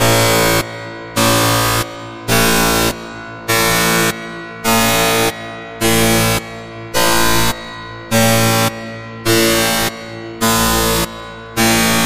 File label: Download Alert